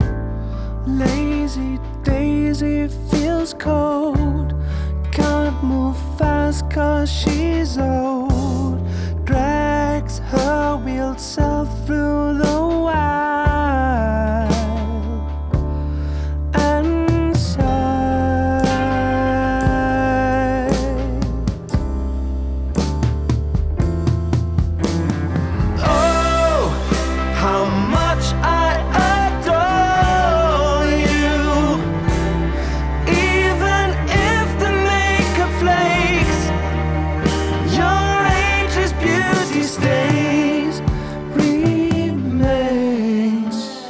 Stem Mix VSC 4:1 Ratio 03ms Attack Auto Fast Release 5 dB GR Peak Stereo; SC SC Filter Off